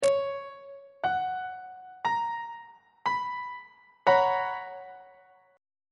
b major 7th suspended 2nd 1st inversion on treble clef